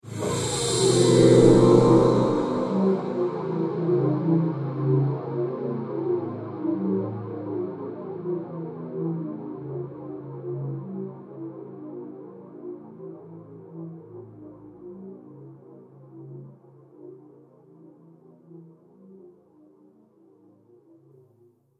Reveal SciFi Trailer Magical Trails Off, Musical Reveal Scifi Trailer Magical Ethereal; Trailers (Sound Effects:Sound Design)